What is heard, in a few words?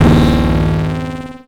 Transition Hit Impact Distorted; Adobe; Production Element